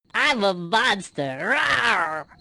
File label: rawr